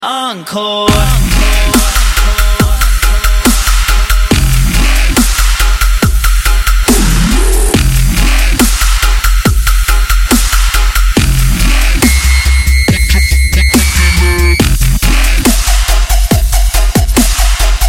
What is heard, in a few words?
dubstep